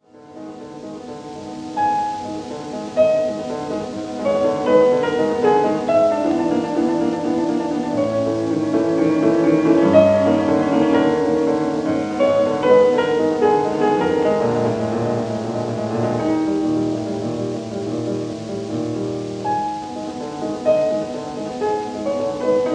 Symphonic Etudes for piano, Variation, Con espress; Schumann; Op. 13